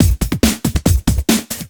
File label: Breakbeat 140 BPM; Vengeance - Essential Clubsounds Vol.3; Loops 140 BPM